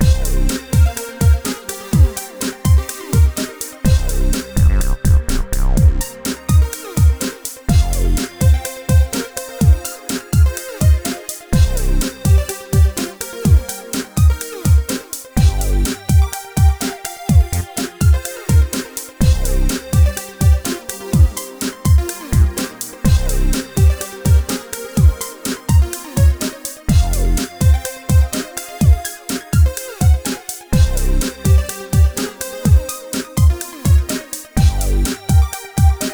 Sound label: Warped Alien Band Looping; funny quirky